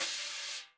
brush; Northstar - New Gold VOL-2; 50S BRUSH

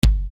Bass Dr, EuroDance Bdrums; Best Service; Ethnic Journey Sound Cube; EuroDance Essentials

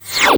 DOPPLE WHIZ; Spectrasonic Distorted Reality 1

